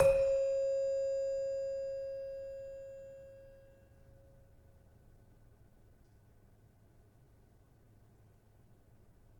Demu 5 C, gravity harps tuned

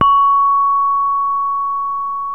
gangsa hi, gamelan; gravityharps

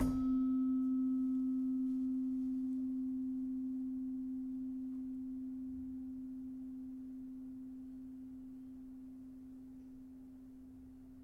Slentem, gamelan

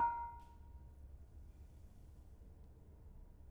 Bonang Panerus soft, gamelan; gravityharps; timbrebox

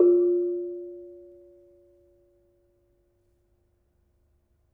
Bonang Barung Sl 3 soft, gamelan; gravityharps; timbrebox

